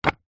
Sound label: brick stack on top of another; foley